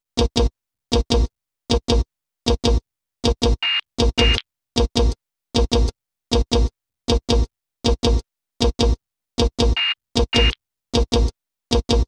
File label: Melody, Hip-Hop